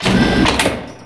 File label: door; hl2; cstrike